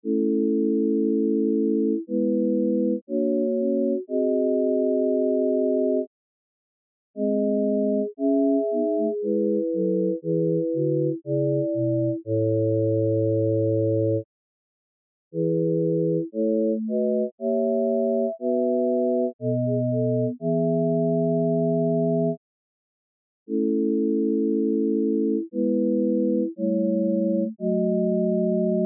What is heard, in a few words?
choral et12, WTC Tuning Audio; Mark Lindley WTC 1